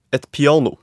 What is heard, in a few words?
piano